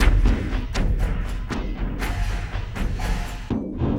Elektro Thunk Cym, Drums; Sonic Foundry (Sony Creative Software); Friendly Fire; Marc Steve

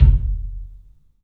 KICK, 3-D Percussives; E-MU Producer Series Vol. 5 – 3-D Audio Collection; P.A. Kit

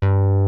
FRONT ATTACK, MINIMOOG

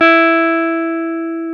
Jazz Guitar

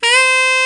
TEN SCP C 72, TENOR SAX; Northstar - New Gold VOL-1

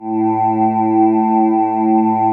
MEN AAH A2

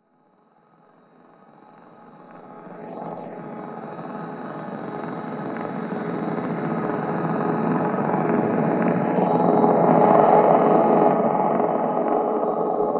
fly pass; Polyend

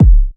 kick basic; Polyend; Recue

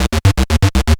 Techno Synth

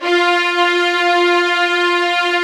VLN F4, LOUD VIOLINS; Q Up Arts; Denny Jaeger Master Violin Library

